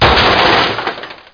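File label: metal break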